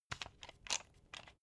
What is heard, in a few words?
pepper mill assemble; berufe; gastronomie; soundpool